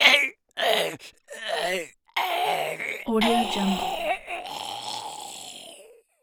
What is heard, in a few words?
zombie growl death